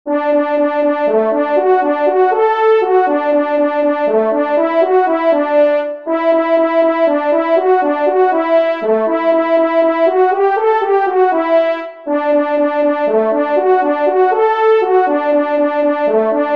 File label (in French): Louvart Solo, trompes; Fanfares imposables en Concours